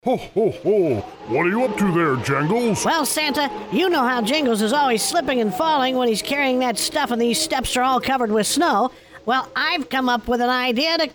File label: jingles